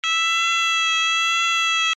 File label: alarm tone, sounder alarm tone; sounder beacon